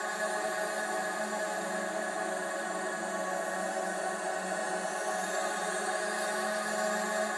holy floating

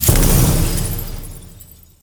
bomb explosion short

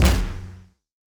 hit wave medium; CrossCode; battle

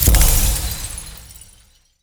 bomb explosion

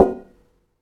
metal collision mid; The Swapper

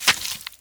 splash, water; World Of Goo

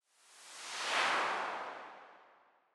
swoosh fall short

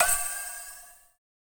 ferro switch hit

